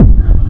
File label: industrial techno kick oneshot - f; kick drum